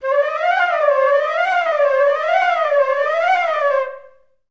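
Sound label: flute run up down F#3; Best Service; Peter Siedlaczek's Advanced Orchestra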